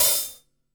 Hi-Hat Open Stereo; Sonic Foundry (Sony Creative Software); Vital Drums

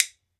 WOOD BONE, Celtic Percussion

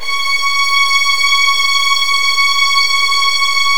C#3, Virtuoso Strings

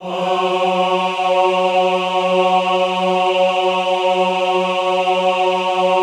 AHH F2, choir; Best Service; Extended Classical Choir; AHH MIX

